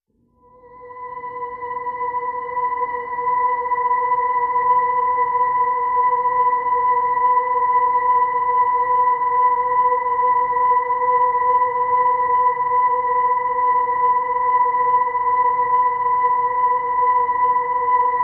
Harmonics B

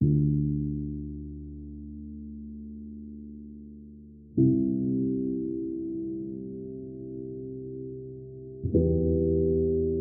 Piano Only

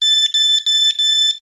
Quick Beeper Alarm

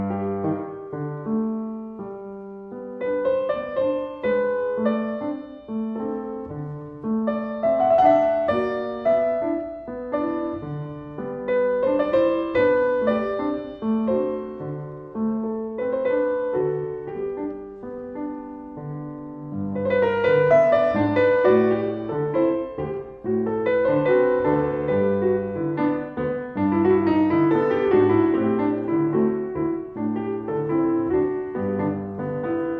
Tango

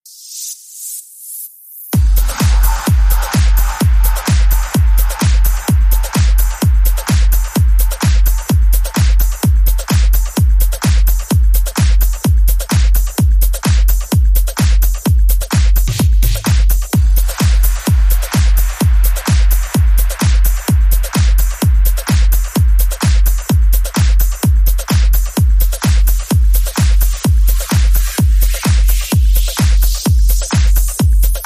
Beat

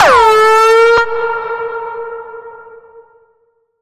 Bend, G sharp

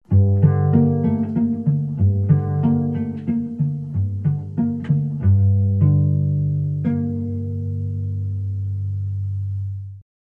Serene Cello

